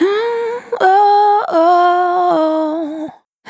Spin Dry, Single Voice Melodic Loops

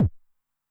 BD Low Tune Long Decay, 909mod, BASS DRUM; classic machines

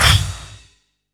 87 VRAC CRH, CYMBALS, Industrial Loops; USB Soundscan vol.24; AKAI

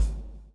BLOW; Spectrasonics - Backbeat

